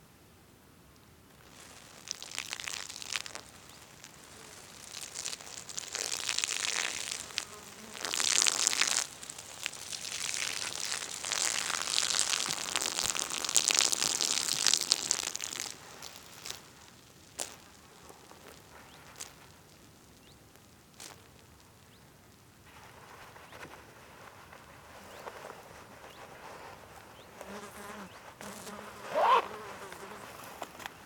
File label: Pee